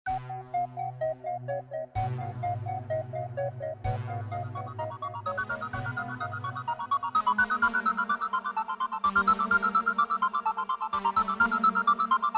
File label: Instrumental